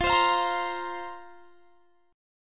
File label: F Suspended 4th F; Fsus4; strummed; x,20,22,22,23,20